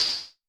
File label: HH, Vermona Mono15 Drums